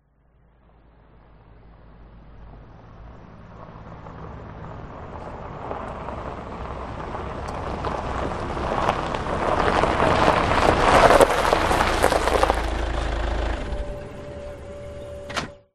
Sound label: transport car suv approach off gravel track; holden captiva